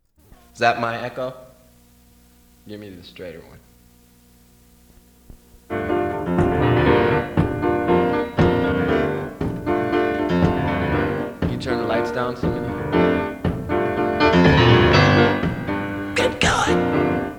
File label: piano a microphone; 1983 version